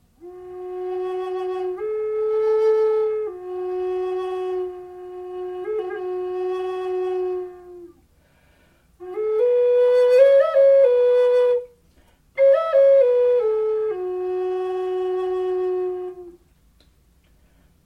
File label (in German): Flöte Fis